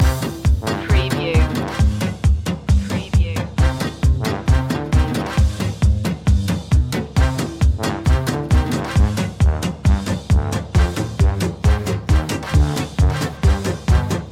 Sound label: loop